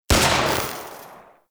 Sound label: Inf Uzi Shot; Infested; Weapons